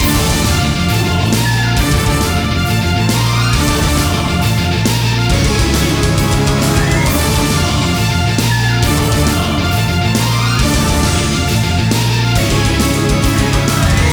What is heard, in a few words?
Bar Fight; Orcs Must Die